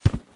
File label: male step